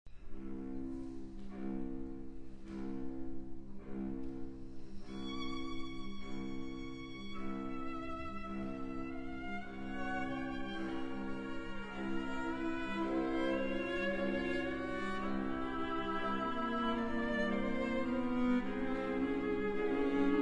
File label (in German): Streichquartett c-moll - Einleitung (Sehr langsam); Mandelring Quartett; Hans Rott